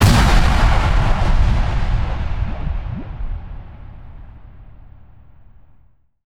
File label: FX Impact; Mutekki Media; Vengeance Electroshock Vol.2